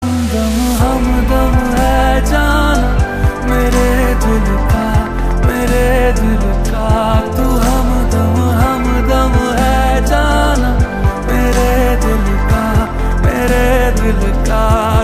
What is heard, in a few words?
Ringtone; T-Series